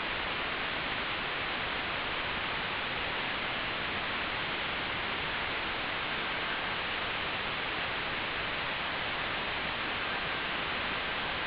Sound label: Moon Bounce Perseid